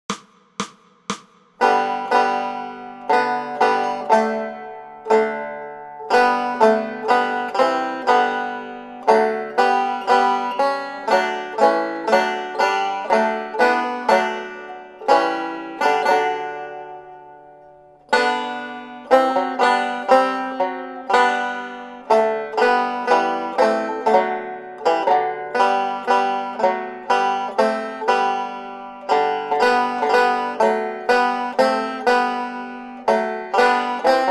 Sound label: Banjo; O’ Come All Ye Faithful; Banjo Tablature with Play-along Audio Tracks